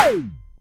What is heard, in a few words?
Roland MC09 Snare